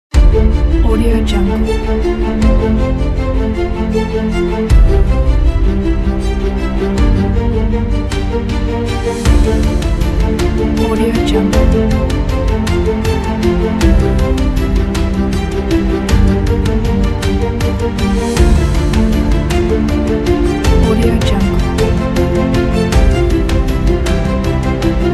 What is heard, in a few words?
epic